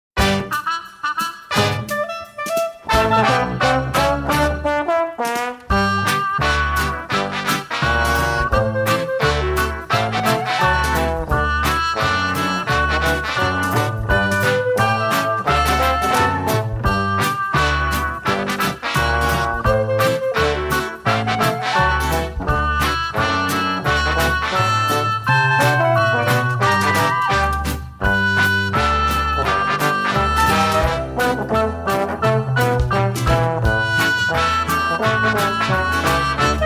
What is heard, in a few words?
Dixieland